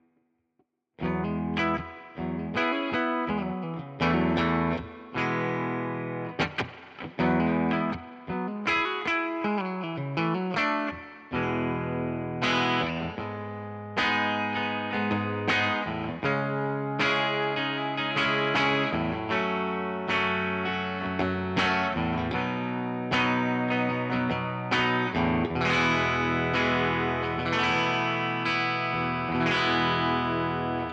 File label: Bridge Clean, electric guitars; Gusta GTL 03i; gtl models